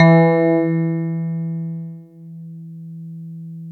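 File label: digital synth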